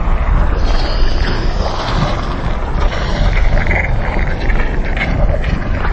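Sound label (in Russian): лава шумный приглушённый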